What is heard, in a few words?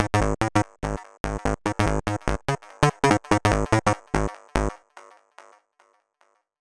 145 Jam Bass, 145bpm, Nu Dance Electro; Zero-G; Soundclash; Combi Loops; Inline Jam